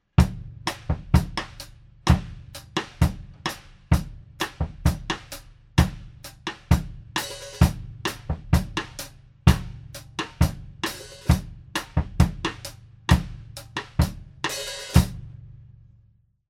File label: bossa